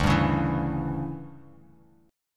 D Major 7th Suspended 2nd Suspended 4th D down, chord; DM7sus2sus4; strummed; JGuitar